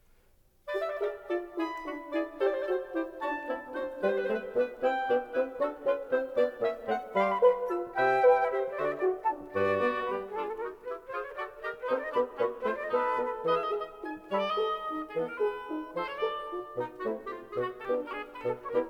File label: quintet, chamber; Arrieu